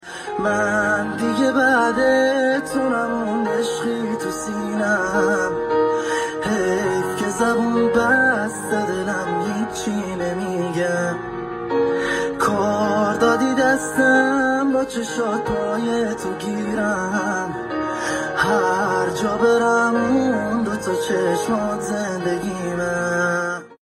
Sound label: Piano